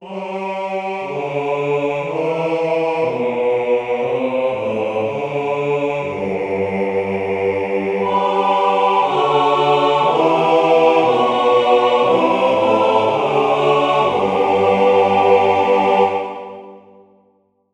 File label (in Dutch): 4 stemmig amen bas